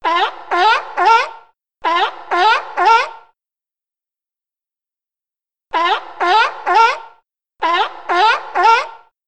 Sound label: Seal